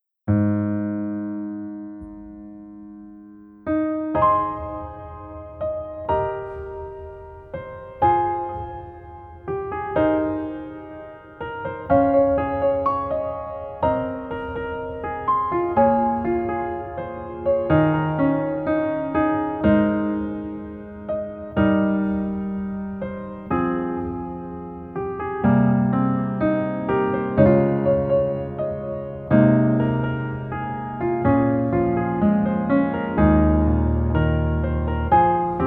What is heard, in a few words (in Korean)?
Piano ver, 멜로디MR